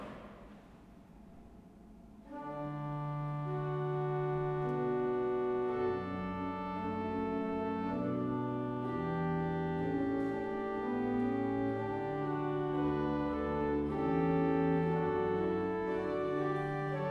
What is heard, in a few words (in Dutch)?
air, orgel; Odoorn; Drenthe; Battishill